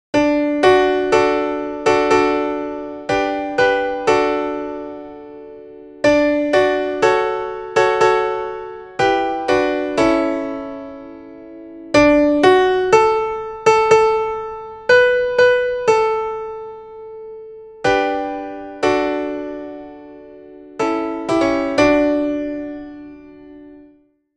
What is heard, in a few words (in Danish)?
alle stemmer